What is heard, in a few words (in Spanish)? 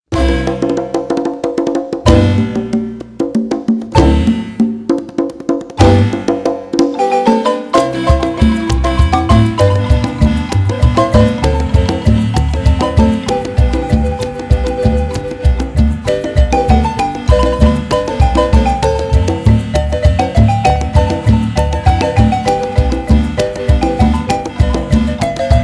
marimba